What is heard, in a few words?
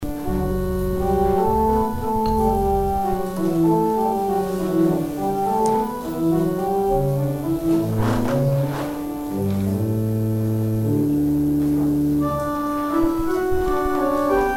organ interlude; Offering interlude